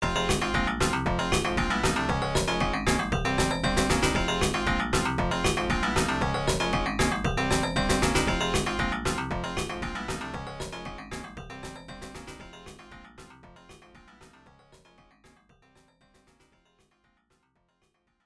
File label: Pinch (Quiz BGM)